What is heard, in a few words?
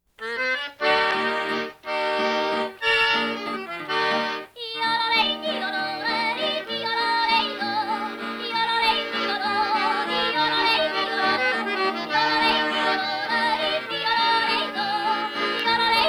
Alpine musicians, female yodelers - Piedmont Jodoler (Yodeling Song); PIEDMONT, EMELIA, LOMBARDY (vol1) DALLE RICERCHE DI ALAN LOMAX IN NORDITALIA